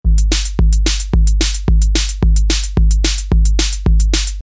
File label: Offbeat